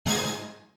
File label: prize hit; Crazy Cars; wazdan